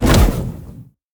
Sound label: dragons attack; sfx; murglun